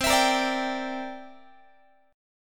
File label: C 9th, Guitar, chord; strummed